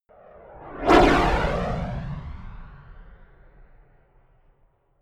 rocket maverick flyby whoosh fast right to left close